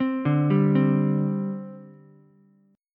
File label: C Suspended 2nd C Ukulele; arpeggiated; JGuitar